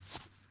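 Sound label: footstep bare grass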